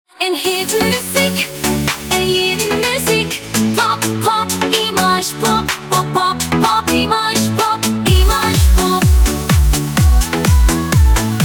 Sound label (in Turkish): IMAJ POP